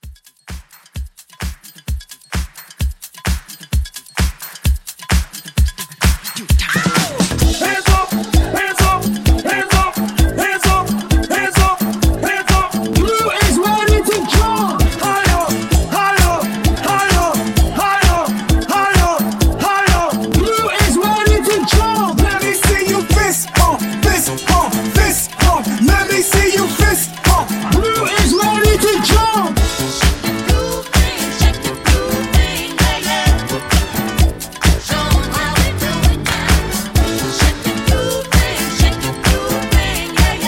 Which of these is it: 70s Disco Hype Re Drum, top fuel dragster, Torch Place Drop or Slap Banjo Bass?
70s Disco Hype Re Drum